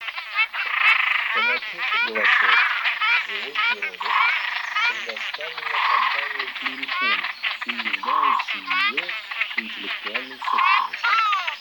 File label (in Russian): Огарь Casarca